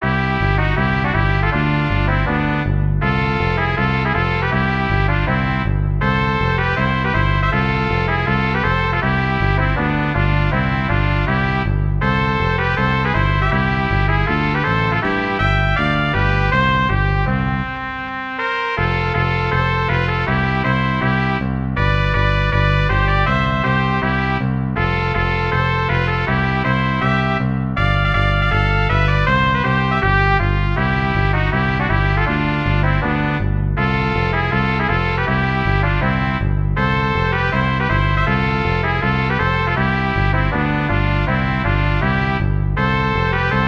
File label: quick polka